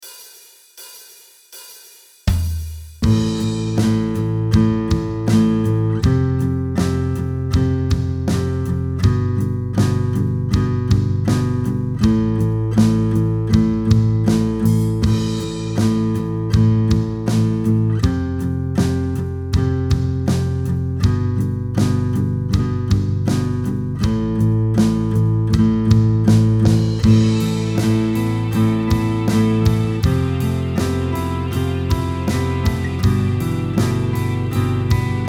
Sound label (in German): Akustik Gitarre Hörbeispiel; akustik gitarre schnellstart; start riff